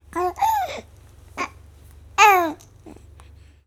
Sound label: Baby babble bit